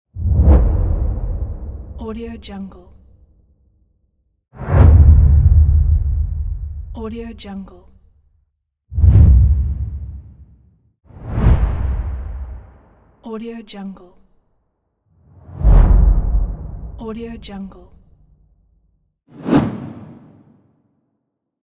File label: woosh